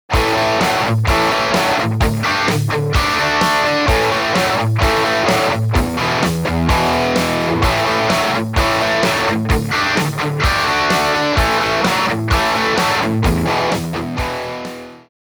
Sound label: Crunch Drums